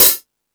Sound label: High Hat, Drum Machines E-MU Pro-Cussion